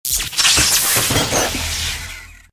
taunt engineer lounge toolbox close; tf; taunts

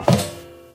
metal grate, footsteps

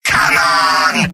battlecry; demoman; video games; source engine; tf; mvm

